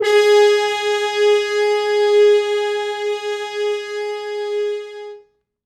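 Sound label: French Horns stops, Quantum Leap Horns Sax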